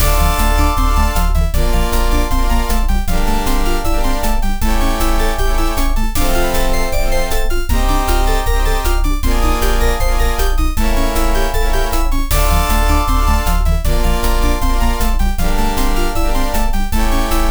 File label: castle battle